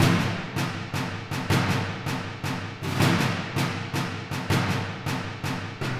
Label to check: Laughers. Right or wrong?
Wrong